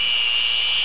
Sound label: Sci-fi UFO Loop; SFX Kit; Sci-Fi Loops